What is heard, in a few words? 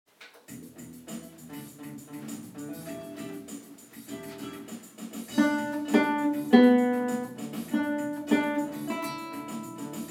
chunk of funk